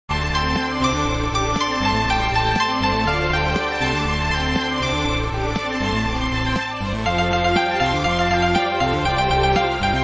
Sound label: Grand Orchestra; Last Ninja Theme